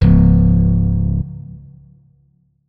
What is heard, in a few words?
notes, guitar; Hideout Furniture